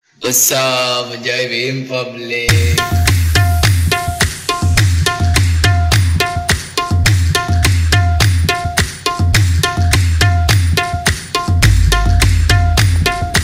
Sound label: viral tabla mix